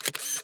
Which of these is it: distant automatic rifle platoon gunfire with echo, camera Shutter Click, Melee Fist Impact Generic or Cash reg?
camera Shutter Click